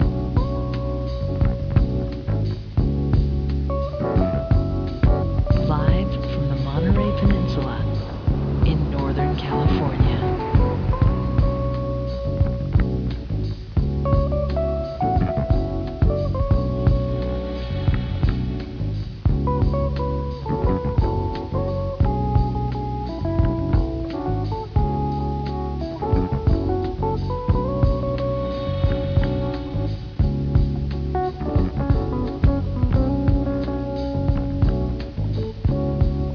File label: smooth jazz radio